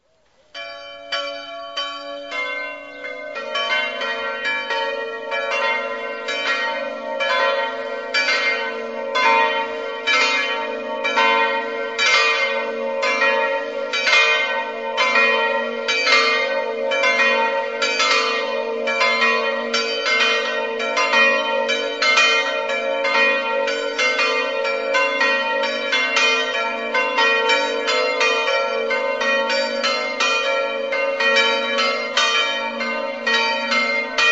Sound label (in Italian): campane